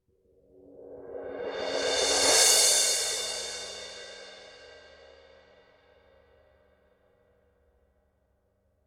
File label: 16 paperthin roll, classic sounds; formula 602